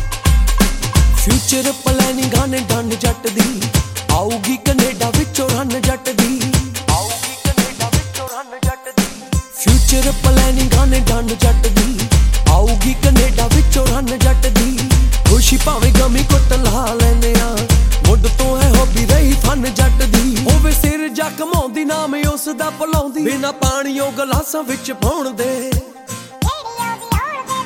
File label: Ringtone Punjabi